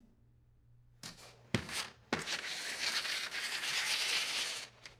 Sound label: hollow paper tube bouncing and sliding